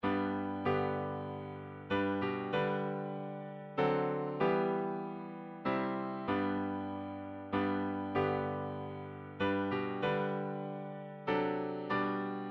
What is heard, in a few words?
amazing message tone